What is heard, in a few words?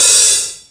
HH, Drum Dry; East Collexion; Drum 1 Dry